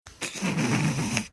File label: snickering